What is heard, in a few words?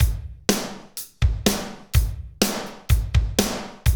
Medium Tekno, Drumscapes Roland; Northstar; Med.Techno